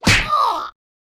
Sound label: hero hits boss